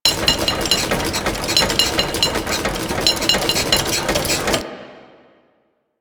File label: Forest Water Piston Machine Loop; Ambience; Props; Warframe; Grineer Forest